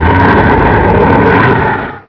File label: attack; eidolon